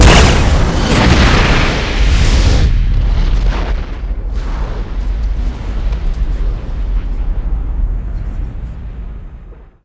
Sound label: nova, Explosion; NanoFXv2; ExplosionFX; ExpNova